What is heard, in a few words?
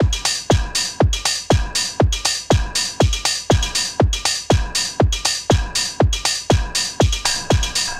4 floor beat, trance